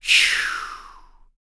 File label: SHEW, BREATH FX, Jazz FX; Spectrasonics; Vocal Planet